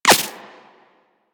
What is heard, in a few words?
Laser Impact Flesh; Warframe; Weapons